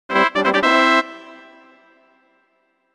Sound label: Banjo Mini Game Win